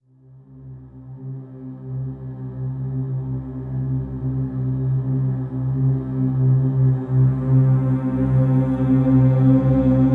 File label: Low Complex Voice, Complex Wave